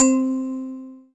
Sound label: SYN, Roland, digital